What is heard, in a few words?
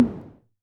Tom High, Korg KR-55B